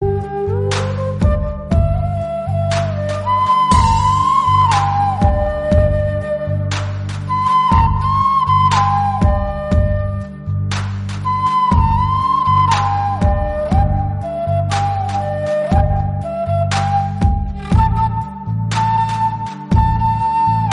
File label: flute